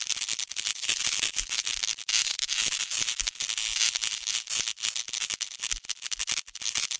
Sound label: Magical Trash; Dalaran; Doodad